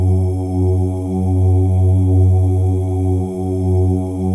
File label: M+F OOS; Spectrasonics; Symphony of Voices